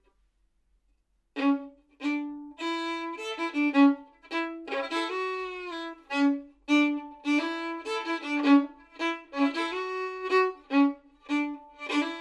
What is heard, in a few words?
riff; Akkar